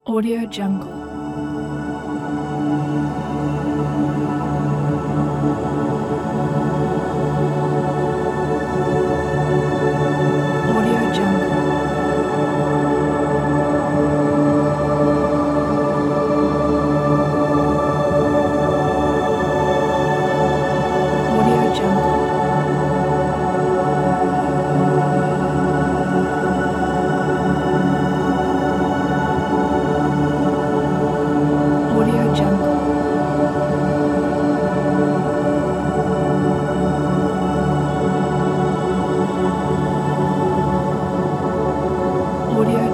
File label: peaceful background ambient